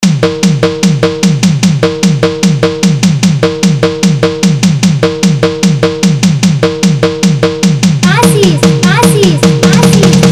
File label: Bhangra Competition Beat Remix